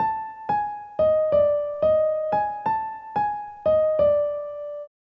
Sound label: Piano, Hip Hop, 090, E min